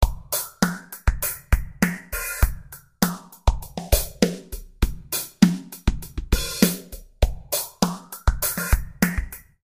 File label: Drumgroove Vintage Phaser Drum-Room